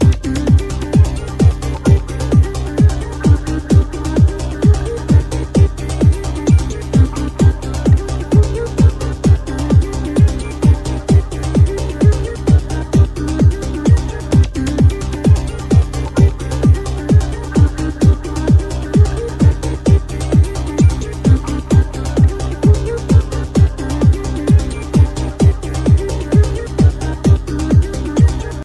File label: Fast Beat